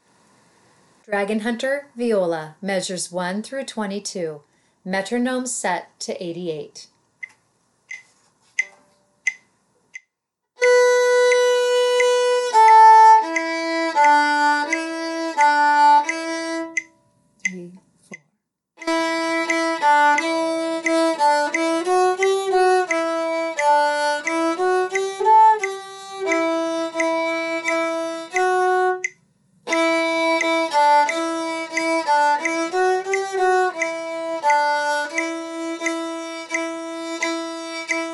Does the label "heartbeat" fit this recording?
No